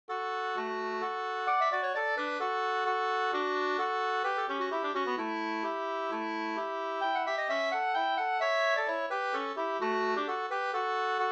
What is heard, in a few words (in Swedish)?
hambopolska